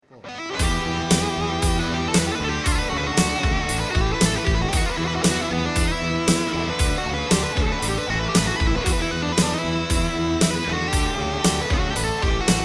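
Schecter OMEN6 extreme, Peavey Bandit-112 Hard Rock song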